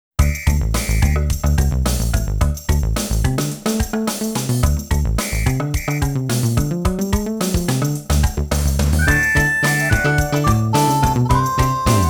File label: WHISTLE; machi